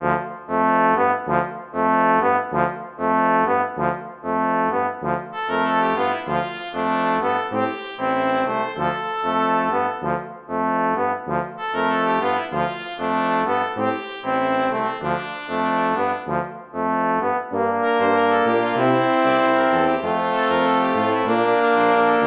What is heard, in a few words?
Samba